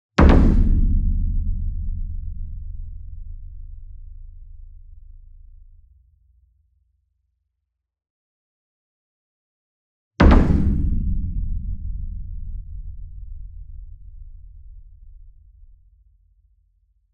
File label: SINGLE DRUM Gong Double Soft Beater Near; boom library; Cinematic Strikes Construction Kit